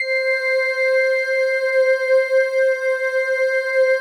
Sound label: PAD, Pads; Best Service; XX Large